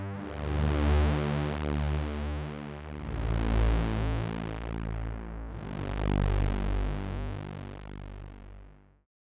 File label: pad; opxpro2; Riddle